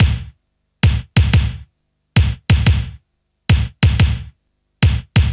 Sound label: Kick Loop, Drum & Bass